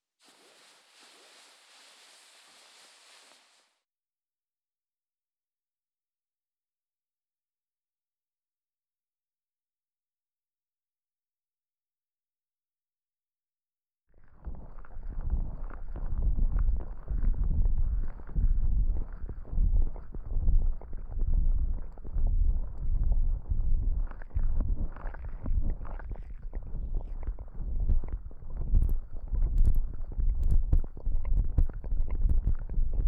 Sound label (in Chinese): 双耳同时舔耳（左右）; 由卡忒莉娜和露琪娅带来的